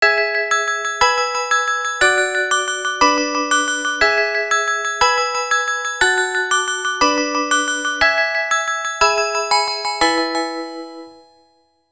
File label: Harmonies; Sony Ericsson; Xperia X1; PlusFourSix; Venus; Lars Edvall